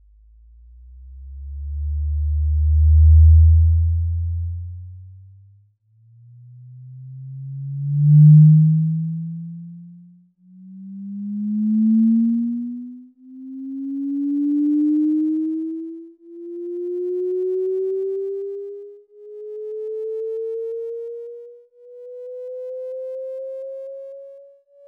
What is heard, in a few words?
the sound of a perfect fluid; MIT physicists find this is the sound of a perfect fluid